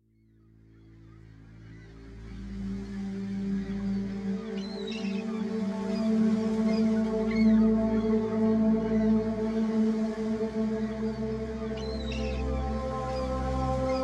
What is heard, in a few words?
Noise By Nature - Harmonie Oceane